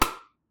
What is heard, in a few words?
Click Sound